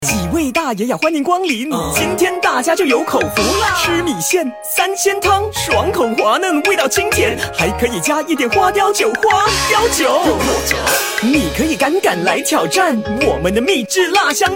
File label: CHINESE RAP